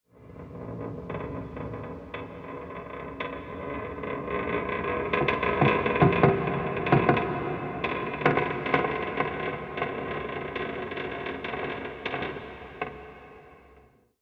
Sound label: soundscape metal creak